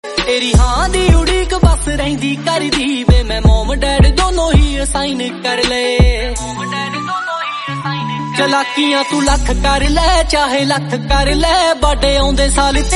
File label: Punjabi Song